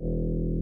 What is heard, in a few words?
chip bass